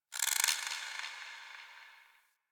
Creature Distant High; Ambience; Misc Outdoor; Warframe; Infested Eris

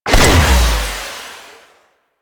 Missile Launcher Fire C; Weapons; Warframe; Railjack

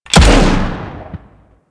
Thumper Bot Rocket Shot A; Warframe; Grineer

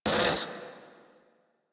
Combat Vocal Pain; Enemies; Warframe; Catbrow

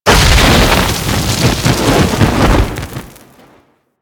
Flame Burst; Explosions; Warframe

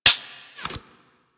Fire Mech, Rifles; Snipetron Vandal

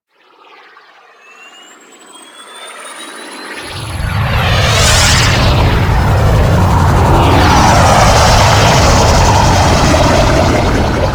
Liset Hub Docking, Ambience; Warframe

